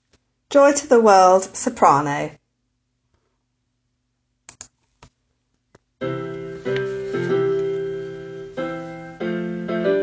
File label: Joy To The World Soprano